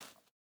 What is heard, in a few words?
footstep barefoot dirt jump start; Rust